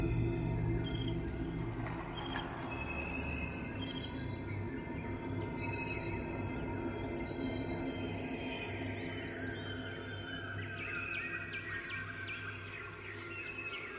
ambient; In The Forest; Wazdan

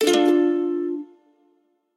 multiline win; Hot Party Deluxe; Wazdan

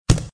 THUD; games; Book Of Ra DX

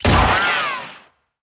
win shot; Wild Guns

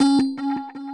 spin scatter; In The Forest; Wazdan

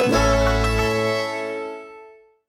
symbol common; Wild Guns

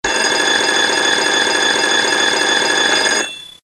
RING LONG; Royal Treasures

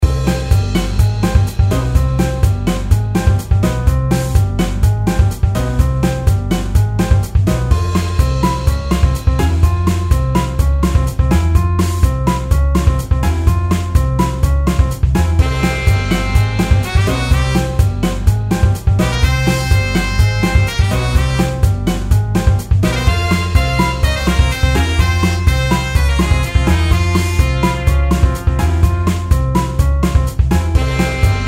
reelspin fg; Speak Easy

